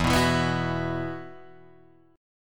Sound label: E Suspended 2nd E, Guitar, Drop D; down